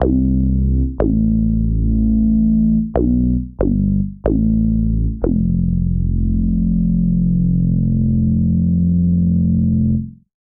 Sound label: Hang Bass A Minor 92 bpm; Bassline; Tonal; MIDI Clips; Drive and Glow; Ableton; Factory Packs